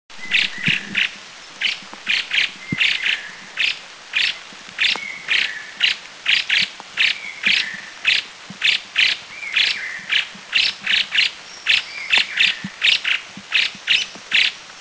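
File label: Scaly Laughingthrush chatter; India; Eaglenest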